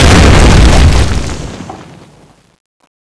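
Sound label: landmine explosion; fallentitan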